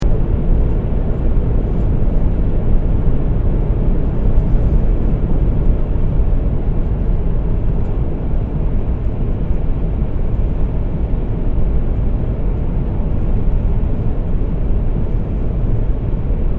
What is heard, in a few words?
Train Shinkansen N700 Japan Interior Tunnel Sequence; Jr; Japanese Trains; Vehicles